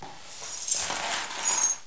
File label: Ice Monster